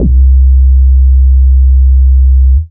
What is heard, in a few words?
Single Electric Basses, Dance Floor Bass